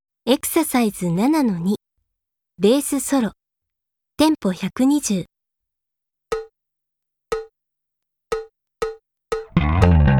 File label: Solo (tempo 120)